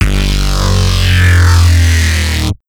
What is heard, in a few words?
Bass Explosion